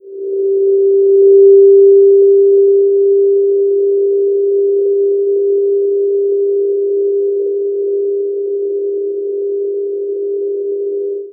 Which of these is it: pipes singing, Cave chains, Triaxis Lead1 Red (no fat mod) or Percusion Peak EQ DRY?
pipes singing